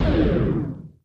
near miss stop; Hot Spin Deluxe

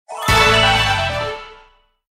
mystery win low; Magic 27

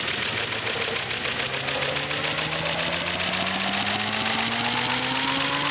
long spin; In The Forest; Wazdan

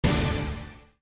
service special stop; Mega Slot 40

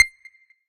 gambler level up; games; Corrida Romance; Wazdan

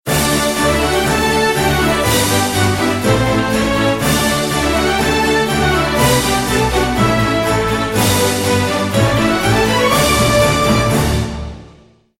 WIN MAX; Golden Reel

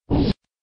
GAMBLE START; Helena

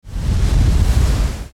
asteroid; Gem Star

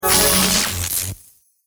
UI card; Paradise

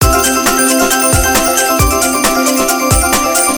countup; Hot Scatter Dice; slot game

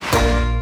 freespin wild; Wild Guns

